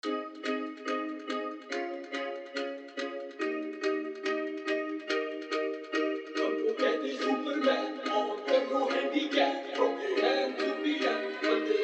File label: Hard Electro Bass Full Club Dance Remix